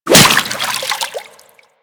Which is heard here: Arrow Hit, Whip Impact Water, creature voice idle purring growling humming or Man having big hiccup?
Whip Impact Water